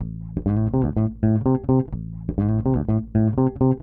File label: DEEPHOUSE BASS 125 A